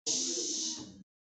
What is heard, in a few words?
shh shhh